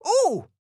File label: Hurt; Fern